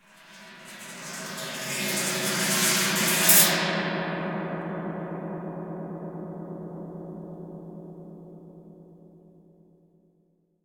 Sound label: Mus Gong scrape Oneshot